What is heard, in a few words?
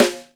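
snare Jazz; hydrogen-0.9.3; GMkit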